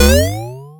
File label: 8 Bit Jump